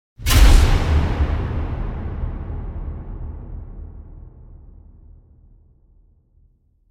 Impact Metallic Big Shocking Strike - Strong Reverb; Hollywood Edge; sound designer's toolkit v2